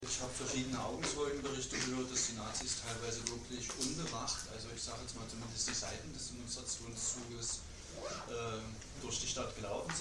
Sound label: atmosphere; Linksfraktion; Sächsischen Landtag; öffentlichen Anhörung; erzeugte Atmosphäre der Angst, Protest durch BürgerInnen am Rande war nicht möglich.